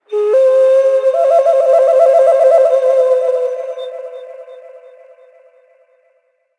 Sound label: panflute; ambient; World of Tanks Blitz; map asia